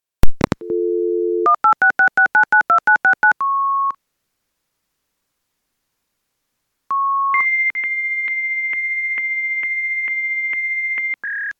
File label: Fax Transmit Beginning